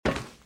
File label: metal; firefight